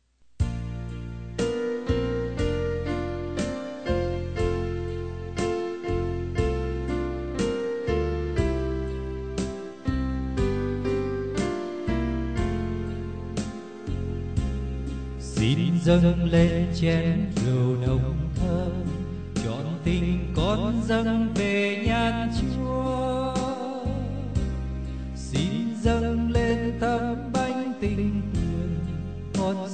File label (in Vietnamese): BASS